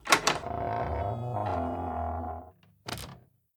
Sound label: Door squeak creak slow; Devour